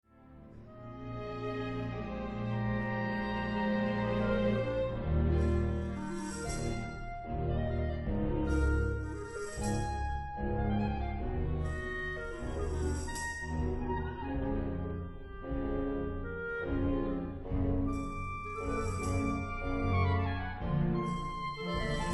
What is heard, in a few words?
Fanfare